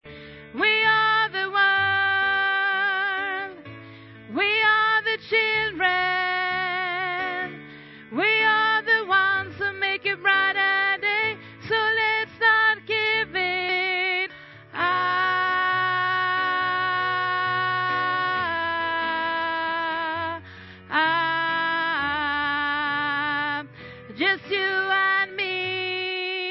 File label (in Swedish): sopran, stämmor